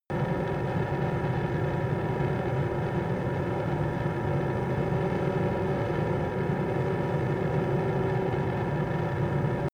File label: military jet fa-18 hornet turbine idle close